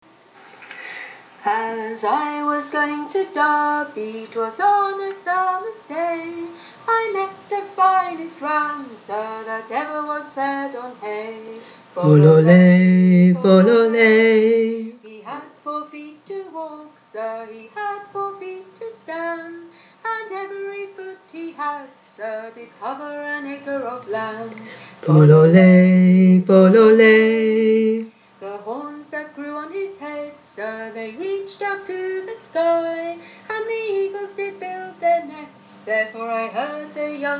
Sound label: Harmony low with melody; The Derby Ram